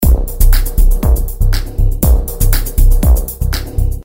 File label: rhythm industrial; Sound Graph